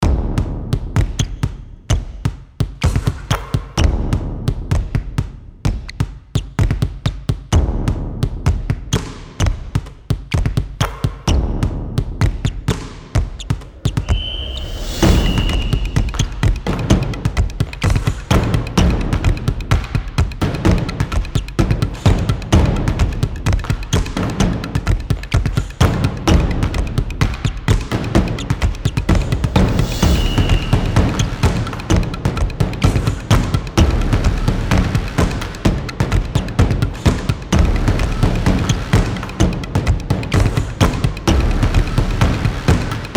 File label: Ring Sound Drums On The Court